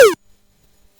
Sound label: missed; HikeMap